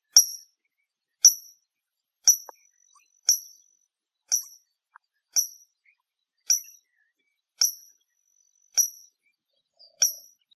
Folaga

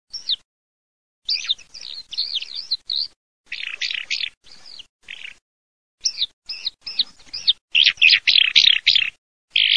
ALLODOLA

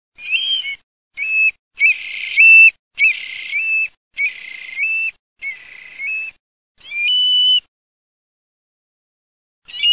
OCCHIONE, birdsound

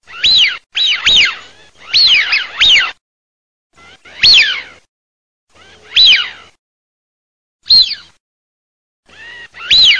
FISCHIONE CAPOBRANCO

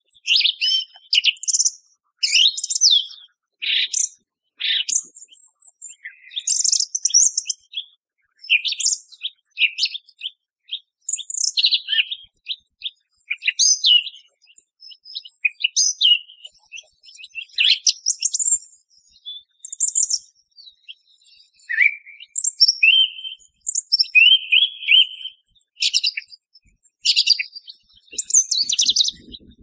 Tordo bottaccio

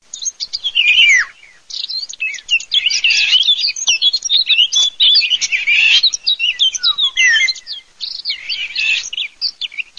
CAPINERA RIGOGOLO

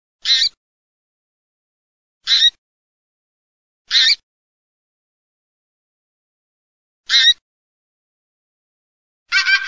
OCA ZAMPE ROSSE

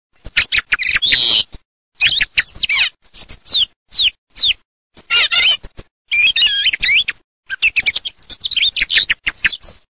tordo, birdsound

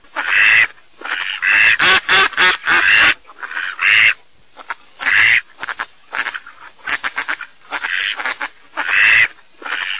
germano, birdsound

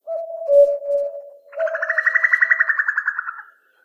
cucolo femmina, birdsound

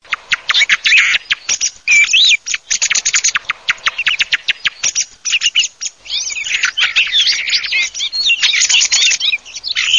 tordo, birdsound

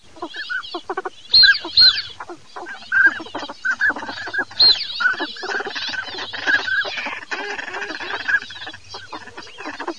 CODONE FISCHIO MASCHIO

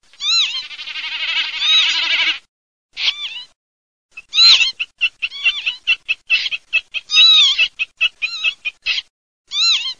PAVONCELLA BECCACCINO, birdsound

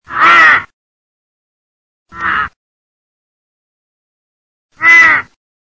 corvo

